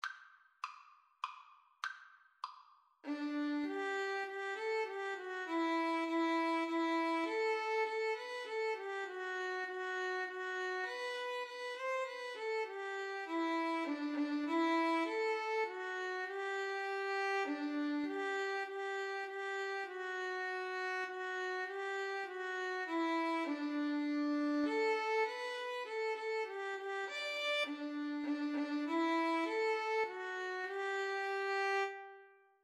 we wish, Violin-Cello Duet

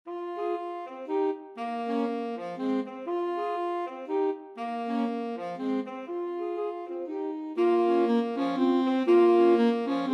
2 altos tenor sax

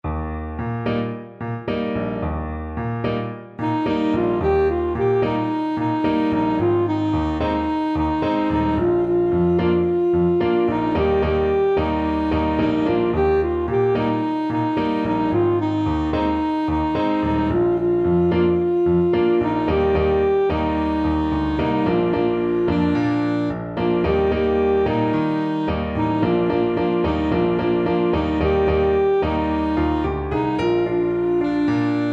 singaba, saxophone

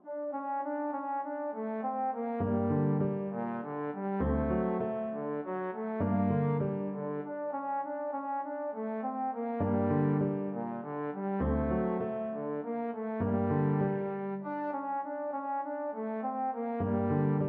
beethoven fur elise, trombone

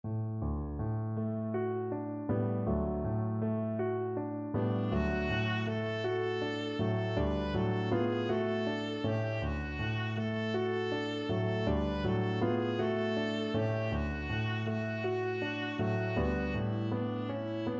viola

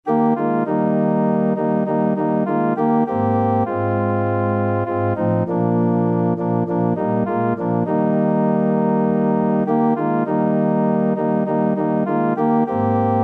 organ

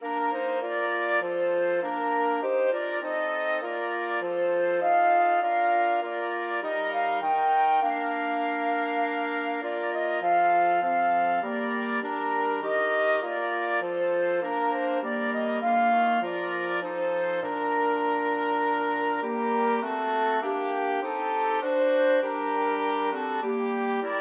flexible mixed ensemble 4 players

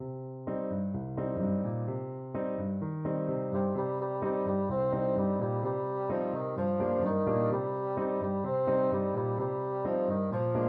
chanukah, bassoon

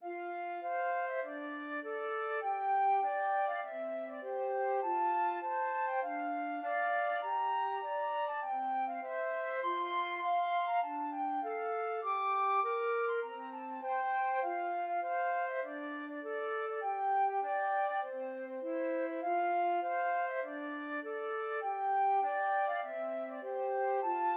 i love the mountains, flute duet

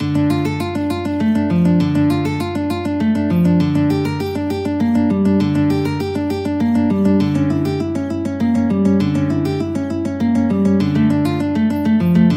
Prelude, guitar; Bach; BWV999

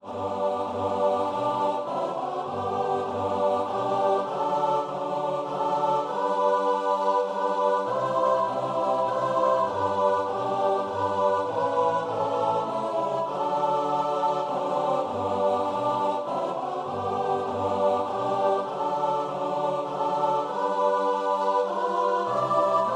CHOIR

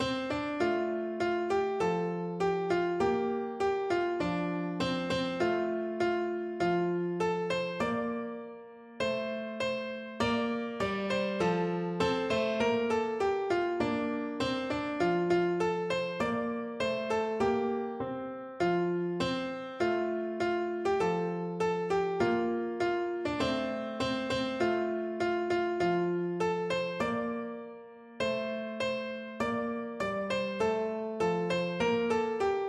piano

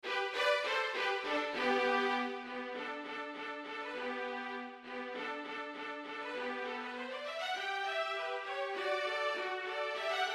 violin viola duet

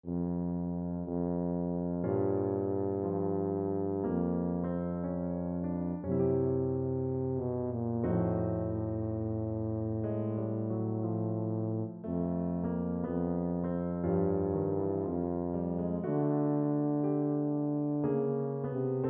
happy jazzy birthday, tuba

